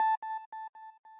sq lead

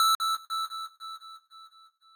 lead

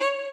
solo

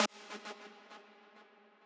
lead

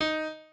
piano

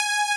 lead

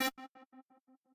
synth

